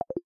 vr button back longpress; LG V20

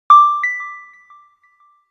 Email, Nokia Alerts; Windows Phone apps

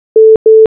Call Wait

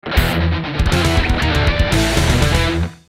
Hard rock message; Nokia; Audio themes

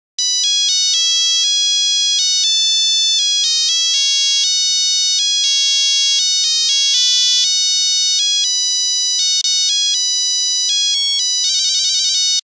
Nokia Buzzer Piano concerto; Windows Phone apps

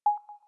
Hot key Loud, Samsung system; Samsung SCH-M710 T Omnia II

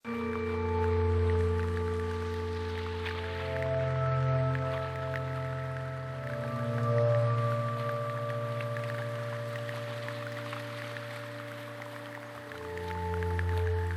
Ambient Glass; Microsoft; Windows Mobile ringtones

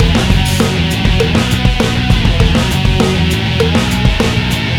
ROCK TONE, ringtones; Google; NewWave Labs

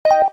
Keytone end; LG SV850 Wine 4

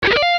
Hard rock fold open; Nokia; Audio themes

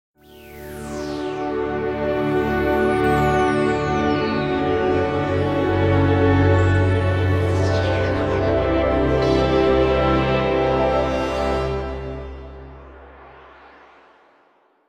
Clock alert; Nokia X3

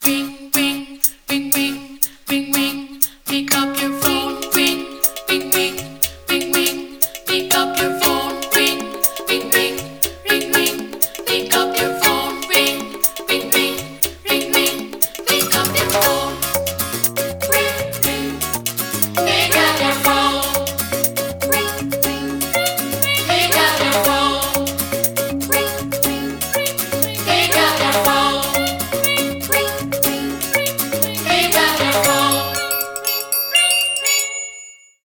Pick Up Your Phone, ringtones; LG L-Fino

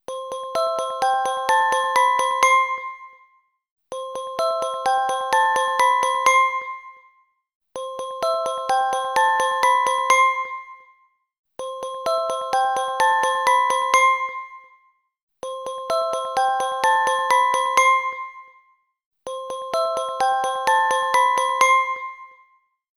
Xylophone, ringtones; LG; G-Vista-VS880

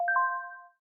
light touchdown, ui; LG Optimus G Pro F240K Lollipop

